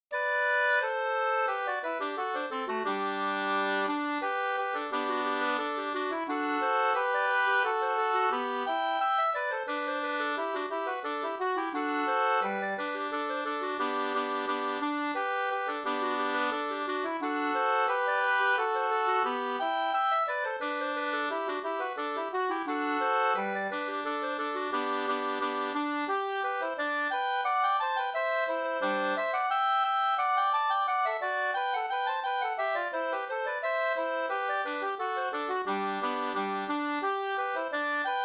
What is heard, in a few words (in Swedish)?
engelska; Hogen